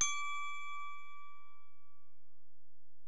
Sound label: Bell, Alesis DM-PRO